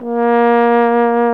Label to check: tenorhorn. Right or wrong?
Right